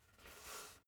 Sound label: basketball spin turn in hands short; sport freizeit